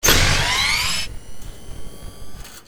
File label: power on